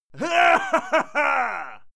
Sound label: male laugh confident